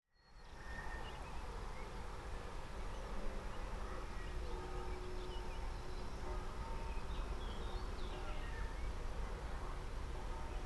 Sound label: AMB RURAL Riverbank Distant Church Bells